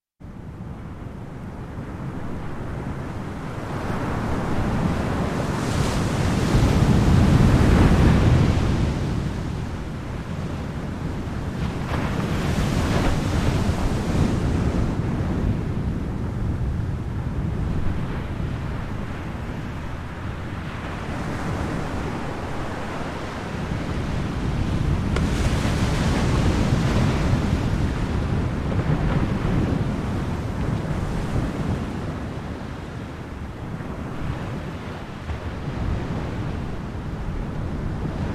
ocean baja

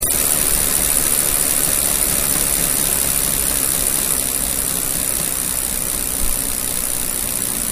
Bike Sprocket Spin

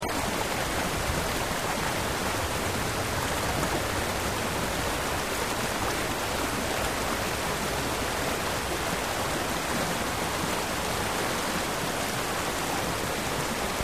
babbling brook

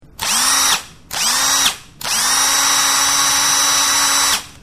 Drill Start

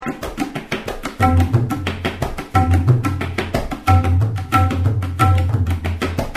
tabla duet

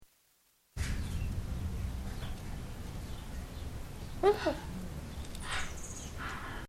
bird noise

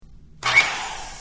saw burst short

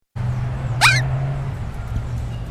bird noise

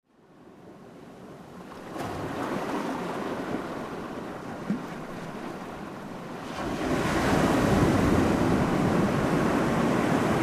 baja ocean

